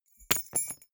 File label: key ring small drop carpet hard